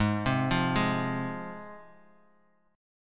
Ab 6th down Guitar; Ab6 arpeggiated; 4E,4A,5D,5G,5C,6F

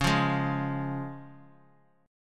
Db Major Db; strummed; JGuitar

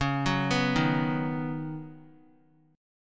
Db 7th Suspended 2nd Suspended 4th, Guitar; Guitar-4F,4A,5C,5G,5C,6E; Db7sus2sus4 arpeggiated

